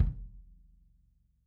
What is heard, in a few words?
L KICK 2 P 3 L, Interactive Drum Kits; Sonic Reality